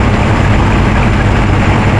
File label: boat engine